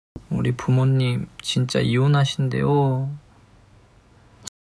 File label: crying